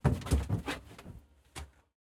Police Ford Transit Van Cell Foley, UK Police Vehicle; InMotionAudio